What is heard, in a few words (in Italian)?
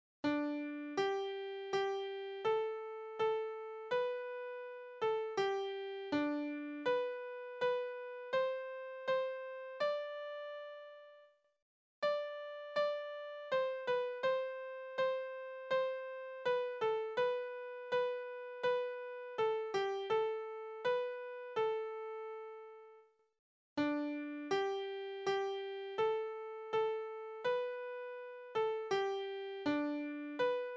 Marcetta di benvenuto ritmo lento per studio